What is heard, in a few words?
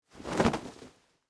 Dress Pants Shake, Foley, Clothes; Dressing Sound Effects; BlastWave